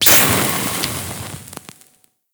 Infested Pistol Gas Burst; Weapons

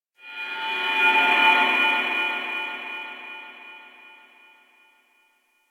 Space Exterior Dist Call, Space Battles, Ambience; Warframe

